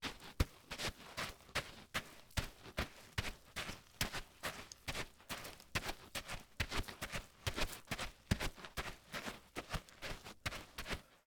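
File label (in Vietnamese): Tiếng bước chân đi nhanh trên nền cát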